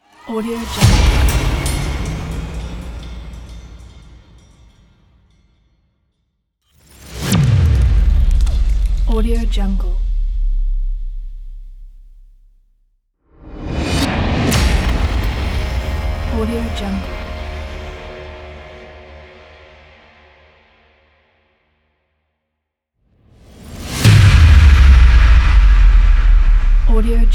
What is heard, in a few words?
massive impacts